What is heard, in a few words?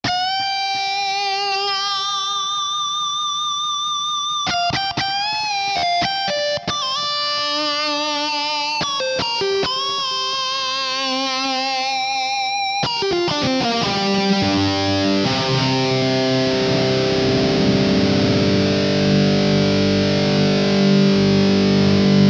Searing Guitar Roland Lucina AX-09